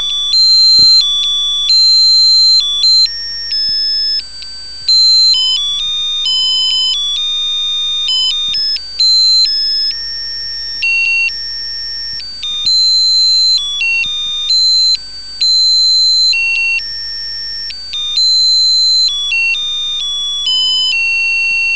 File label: lullaby, melody; brahms lullaby